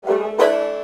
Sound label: banjo chord